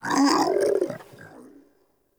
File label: Cannonball Thrust Vocal; Powers; Bromius